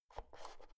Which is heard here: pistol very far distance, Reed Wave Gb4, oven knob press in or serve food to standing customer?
oven knob press in